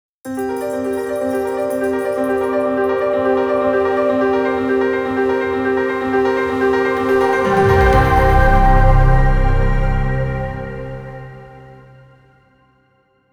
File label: Jingle; Chaos Emerald Vault